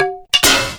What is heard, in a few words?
shovel drop